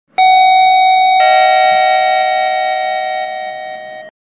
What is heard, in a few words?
chime; polestar